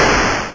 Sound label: 8bit kit explosion; LO FI